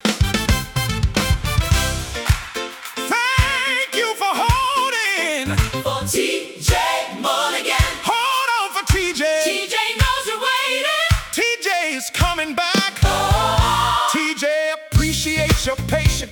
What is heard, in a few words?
Gospel Funk